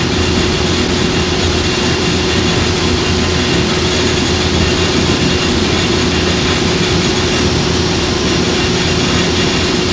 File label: stairs, highbay ambience